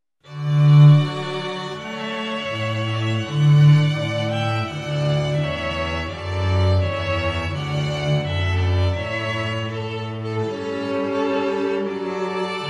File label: Trumpet Voluntary; Clark